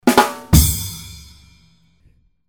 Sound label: rim shot